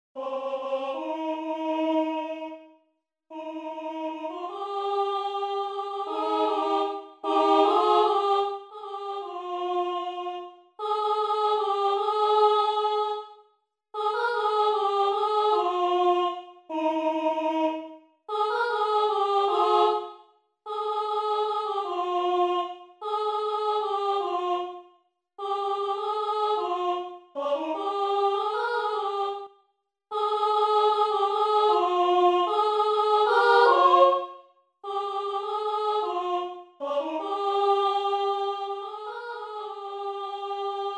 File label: soprano; ciganin